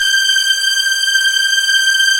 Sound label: string; String Master Series; Vlns 2-5; Vls3 Brt slo